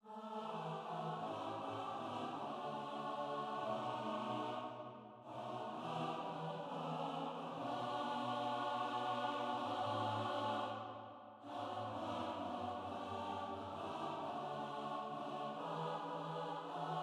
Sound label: SATB